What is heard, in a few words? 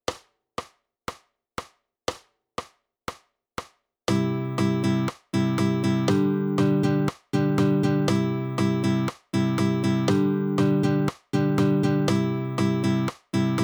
Strumming Pattern